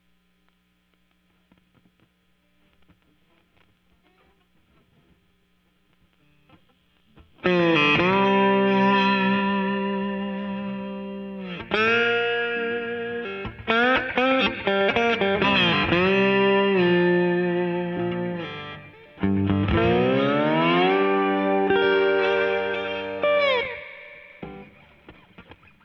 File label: Slide Guitar